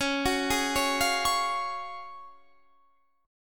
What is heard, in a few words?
F sharp Major 7th Suspended 2nd; 21,21,18,18,18,21